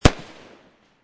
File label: M40 Sniper Rifle Bridge; Rifles; Guns; VRSonic